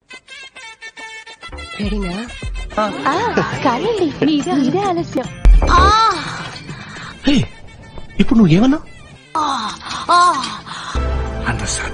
moaning bgm; troll; vikram movie; xr beats

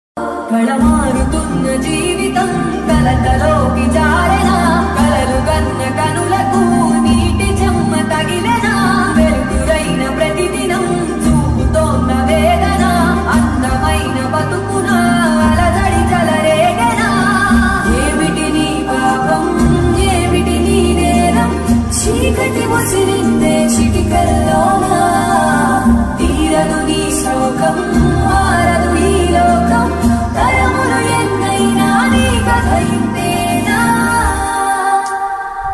female sad version bgm ringtone

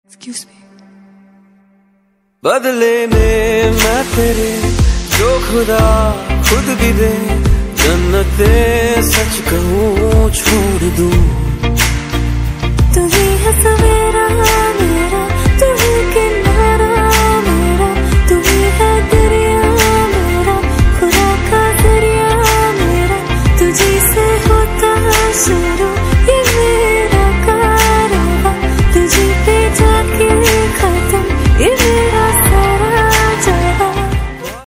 song ringtone bollywood dj remix ringtone

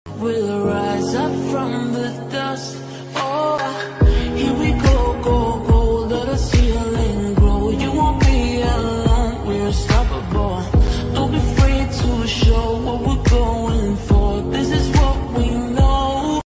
attitude ringtone bgm ringtones english ringtone

